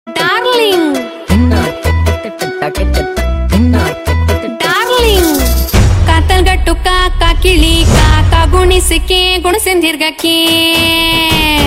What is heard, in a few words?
Kannada Song